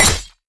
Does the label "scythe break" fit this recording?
Yes